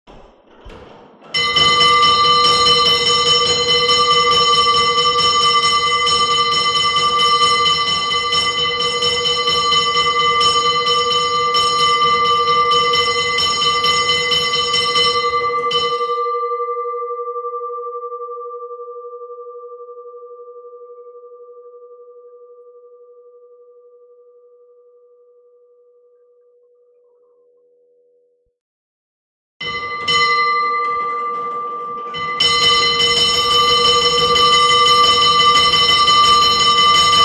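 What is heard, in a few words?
Hand Cranked Fire Alarm, bells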